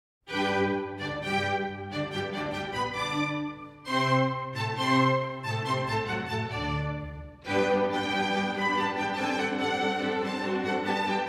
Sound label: Serenade No. 13 in G Major, K. 525; Eine kleine Nachtmusik; Mozart, Wolfgang Amadeus